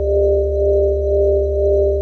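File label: Ambience Sci-fi Energy Loop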